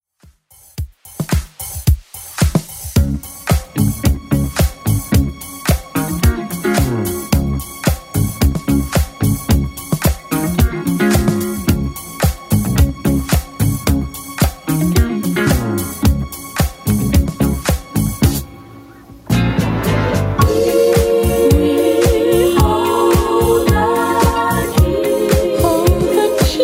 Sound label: 80s Club Edit 110bpm